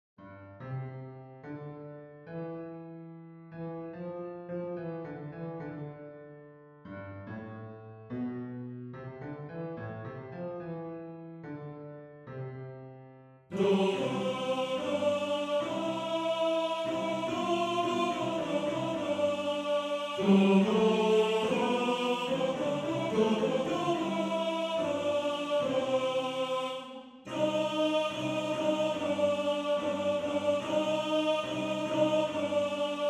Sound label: Tenor; Tenor 1